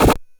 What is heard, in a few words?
Drums, Hits; DCM8